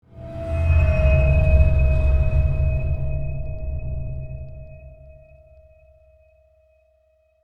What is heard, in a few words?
Tense Stinger